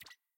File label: ui button confirm hover; tft